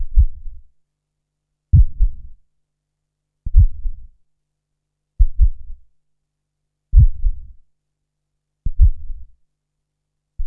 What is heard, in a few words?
HEARTBEAT; Zero-G Ambient 1; HEARTBEATS